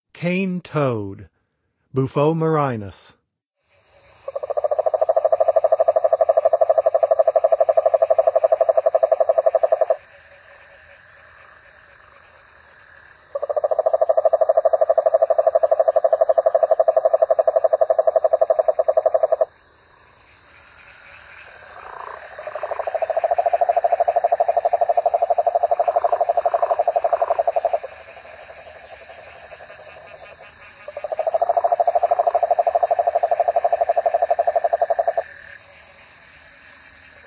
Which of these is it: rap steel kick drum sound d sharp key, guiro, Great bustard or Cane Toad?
Cane Toad